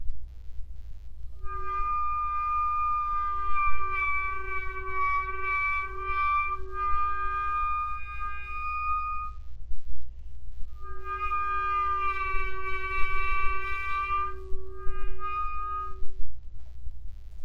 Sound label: two bends